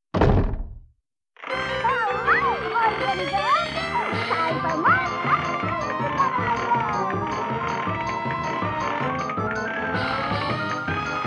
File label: Cartoon Time; Clock Tower; ps1